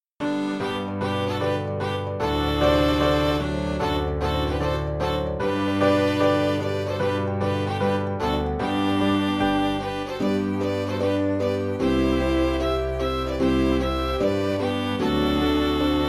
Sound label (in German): Orchesterstück G-Dur